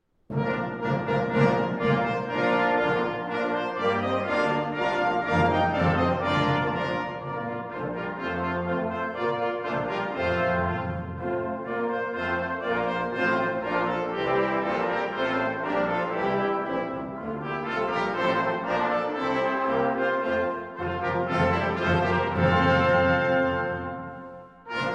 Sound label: Cimbasso Marsch; J.S. Bach